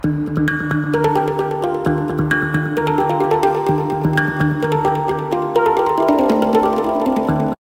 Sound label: hand pan echo